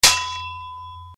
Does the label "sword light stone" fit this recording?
Yes